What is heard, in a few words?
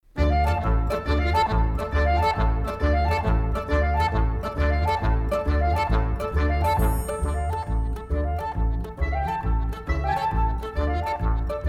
hooks tarantella